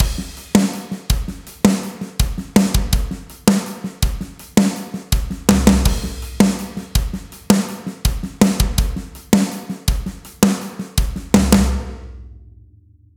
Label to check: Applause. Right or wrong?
Wrong